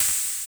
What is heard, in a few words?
HH HH, Dance